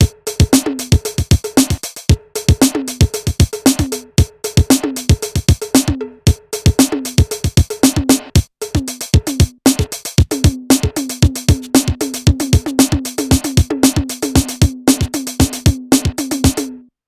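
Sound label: drumtraks hituned neve, acoustic drum machine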